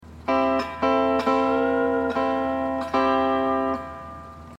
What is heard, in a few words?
hawaiian piece